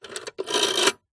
household telephone old fashioned rotary style recorded underside dial